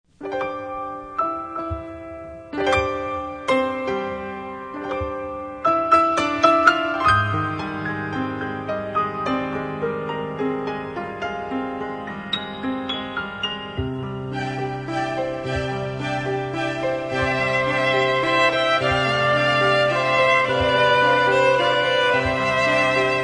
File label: Orchestra, Strings